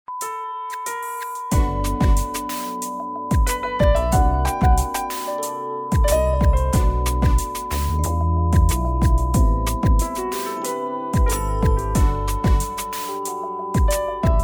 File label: smooth melody